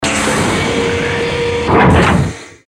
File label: alarm door